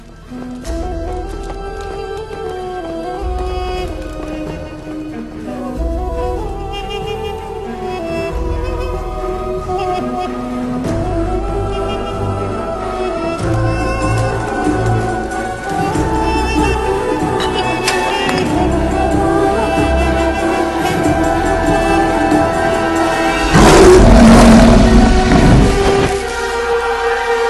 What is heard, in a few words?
Flute; Narnia